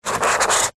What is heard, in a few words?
office marker pen draw line on tissue paper